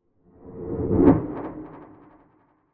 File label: Mysterious Transition; Scary Soundscapes; Horror; CraftedSignals